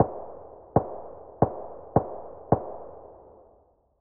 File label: adar tap fast, weapons; SPT Battle Ambience